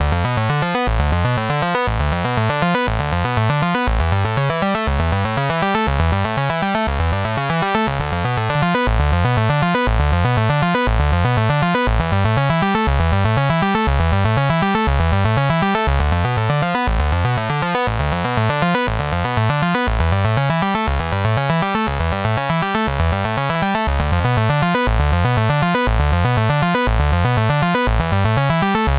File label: Arpeggio VCO DCO Saw; WAVES Element 2